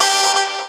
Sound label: Synth Cuts; Vengeance Samples; VES1 Instruments